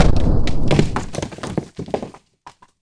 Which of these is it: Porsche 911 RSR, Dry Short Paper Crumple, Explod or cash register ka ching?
Explod